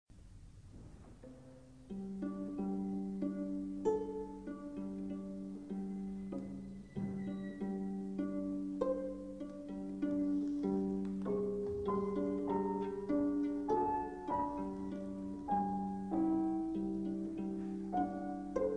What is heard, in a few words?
Sonata for viola & piano; Shostakovich; Op.147; This was the composer's last work and has an air of mysterious resignation about it.